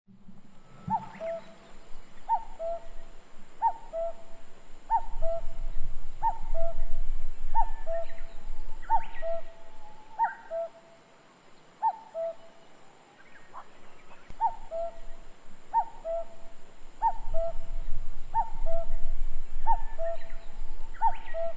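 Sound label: kakkou, cuckoo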